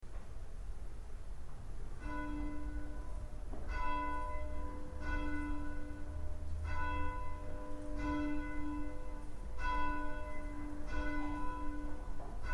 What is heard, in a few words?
bell